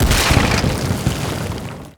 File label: Explosion Large